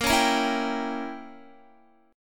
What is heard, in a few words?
A sharp Diminished 7th, Guitar; strummed; chordshape